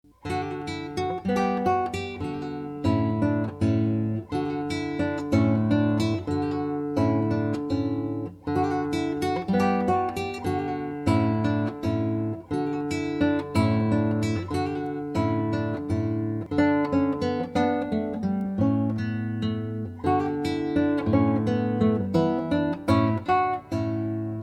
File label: Classical Guitar, Baroque Spanish Dance; Gaspar Sanz